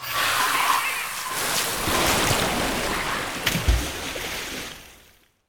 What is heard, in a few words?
snakeboss2 death, monster